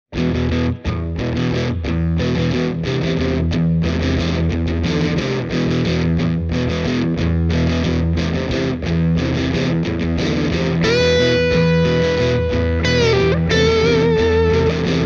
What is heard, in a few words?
brunetti singleman 16 guitars only; demo track